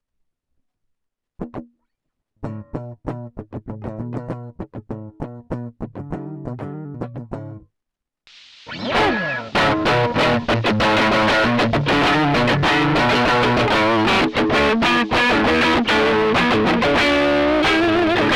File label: Neck Various Settings On Off; Jammy